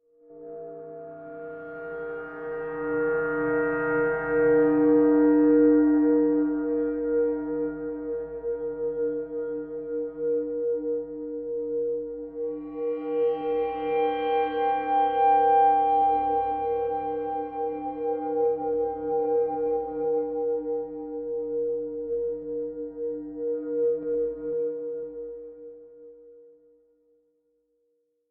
Swell Magical Light Glow; Sound Design; Big Room Sound

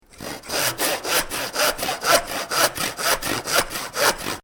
Saw Wood With Hand Saw; Saws; Machines; Stuart Duffield

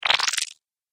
Laser Squelch, Laser Sound Sound Effects

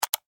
Technology Mouse Click Computer; Computers; Electronics

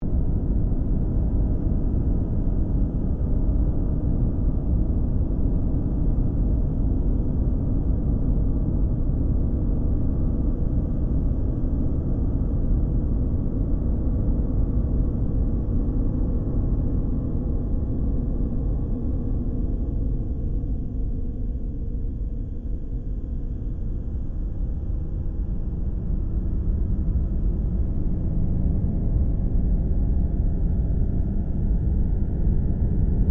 Created Drone Long, Drone Sound Effects; sound building blocks created through manipulation of raw material

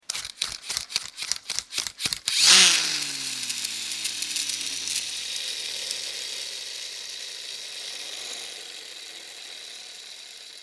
Toy car, Wheels racing round on plastic toy car.; Toy Cars; Toys

